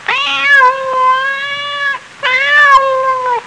cat (crying); Sound Effects for Multimedia - Volume 1; pets

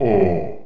ooh

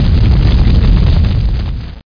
cat miss; Lords2

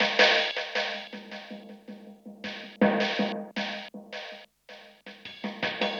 drum loop, ambient, 160; eJay

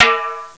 timbale

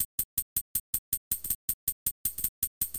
hihat, Drum+Bass, 160

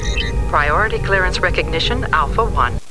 menu command; Star trek

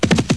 galop; DAoC

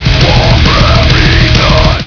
beaten

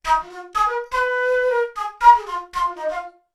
Flute Bathroom

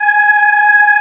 choir aahs G#5; Star Trek JR Audio

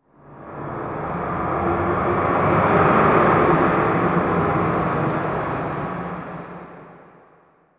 city, zones; Zoom3City

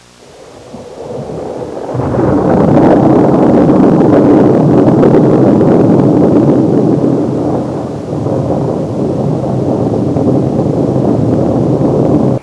rolling thunder; Sound Library 2000; Wayzata Technology; Nature

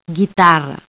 guitar

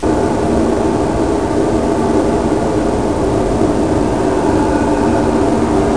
Wind; Zed; Mighty Morphin Power Rangers Screensaver

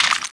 rock deb, impact debris rock

